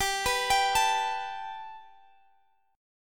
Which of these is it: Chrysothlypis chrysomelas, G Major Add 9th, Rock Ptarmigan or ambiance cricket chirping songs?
G Major Add 9th